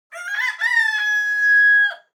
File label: short rooster crowing